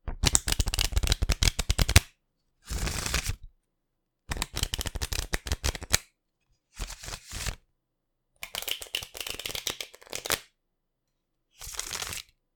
Cards Riffle Shuffle

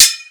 sword

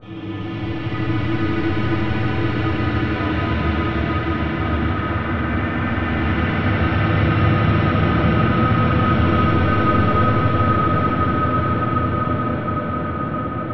Dronescape

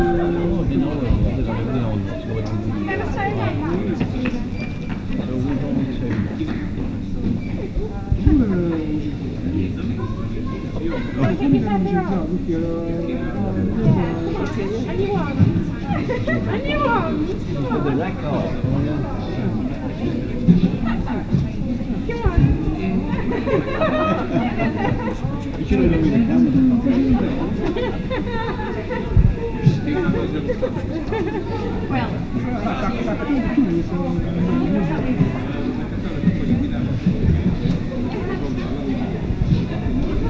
Airport Lounge

